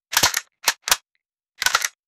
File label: gunpowder rifle bullet; firearms